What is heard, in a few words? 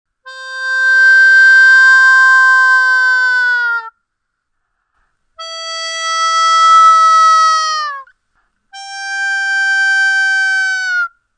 isolated choke